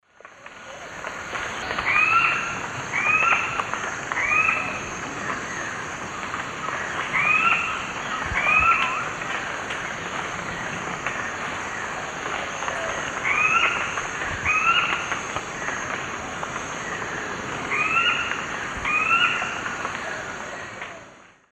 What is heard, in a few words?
Pteroglossus azara, call; S. America; Eastern Lowlands